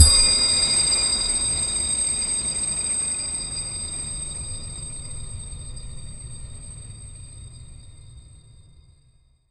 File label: echoing metallic, orchestral hit